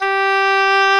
DOUBLE REEDS; OMI - Universe of Sounds

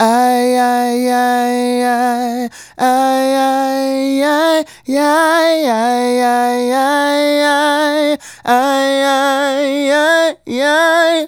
Male Solo, Vocal

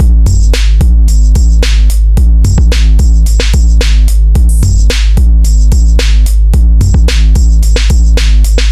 more hip 110bpm

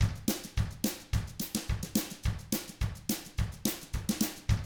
TRAIN, Double Platinum Drums

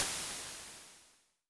Crash Waves, Vermona Mono15 Drums

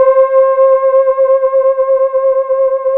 SUPERPAD, ROLAND MKS-80; Rubber Chicken Software Sample Bank

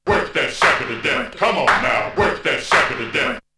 Vocal; ZERO G - Datafile DISC 2

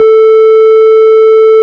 Farfisa; VIP Cl 16' A3; Farfisa Clar DBar

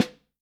SH, Vintage Snare Drum; Q Up Arts; Johnny.C's Vintage Snare Drum; 5.5X14 SLR

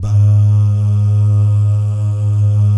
BAH GUY, BAHS GUYS

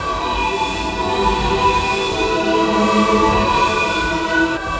Sunscoop Melodic, Tonal Atmospheres; Sonic Foundry (Sony Creative Software); Myths of Technology

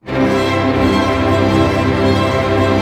STR ARP MAJ, Symphony Orchestra, STR Arpeggio; Roland LCDP08 Symphony Orchestra; STR Arpeggios

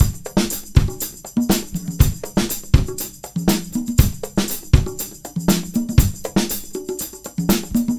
Combo, R&B Drums

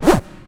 tech fx; Best Service; Hyper Dance; EFFECTS

